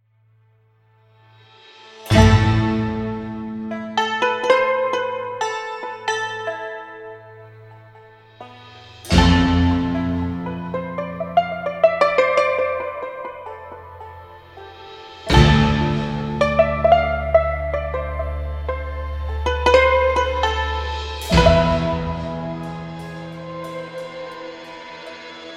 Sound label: santur